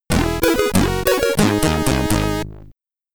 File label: Ending, SID Stereo; Harald Hardtooth; commodore 64